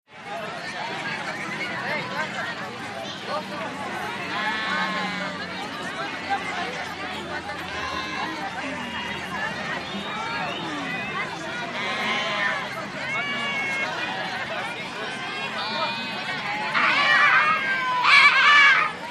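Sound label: Market Spanish Walla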